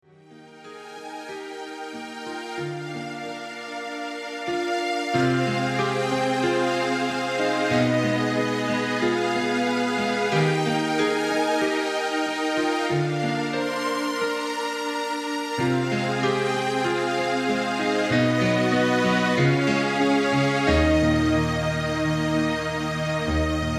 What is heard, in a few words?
strings